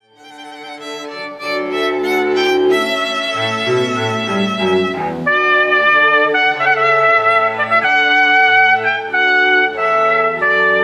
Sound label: Trumpet Voluntary; J Clarke